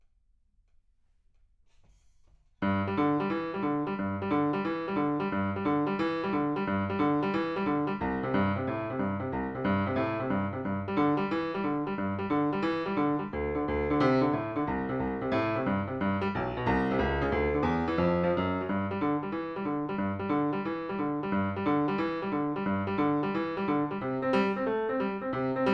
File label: PIANO, RNR for piano